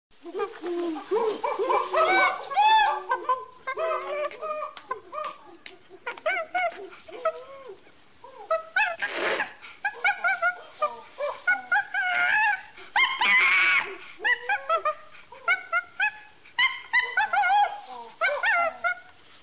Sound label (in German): schimpanse, Zootiere; zootiere erkennen